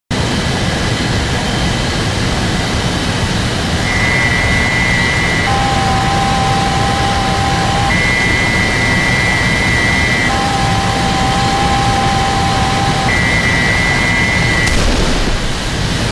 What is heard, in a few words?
Missile lock and shot